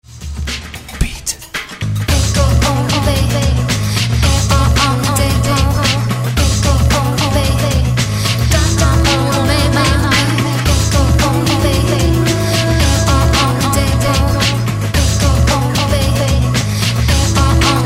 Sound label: Beat